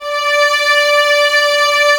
violas D 5, String Ensembles; Miroslav Vitous; VLS 1+2

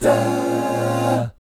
BBMI7 AA, GUY CHORDS; Spectrasonics; Vocal Planet; Jazz FX

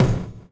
166 BK BD, Explosive Jungle; USB Soundscan vol.05; AKAI

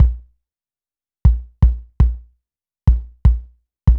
Anabeat Kick

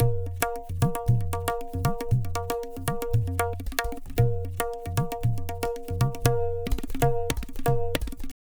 Tabla, 115 BPM; Zero-G; Pure Tabla

